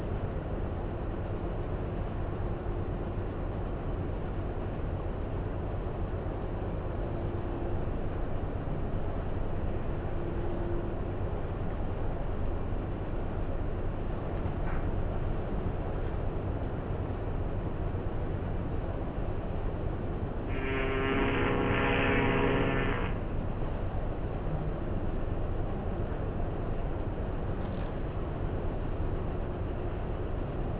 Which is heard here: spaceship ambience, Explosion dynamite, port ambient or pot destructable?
port ambient